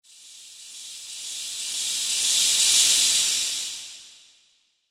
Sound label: Dark Suspense Sweep